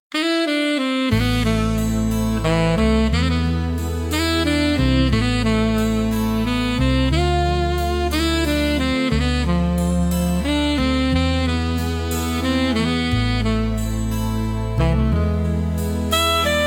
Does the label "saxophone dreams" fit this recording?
Yes